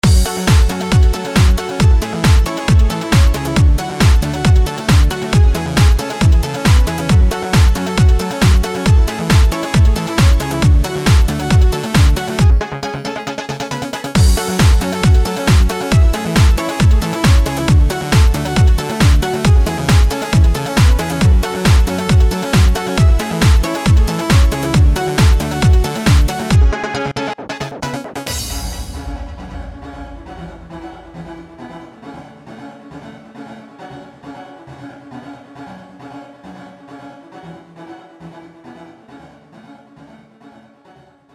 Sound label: trance arp